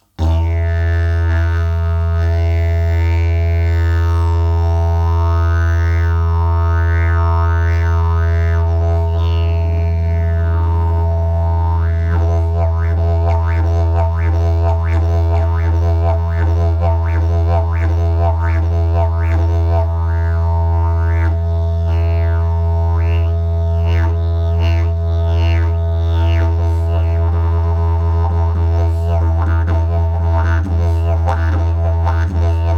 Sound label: didgeridoo; jasan mapozor